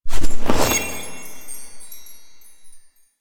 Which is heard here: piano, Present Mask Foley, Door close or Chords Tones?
Present Mask Foley